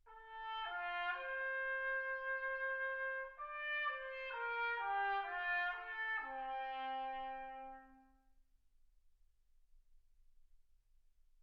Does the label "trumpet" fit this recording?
Yes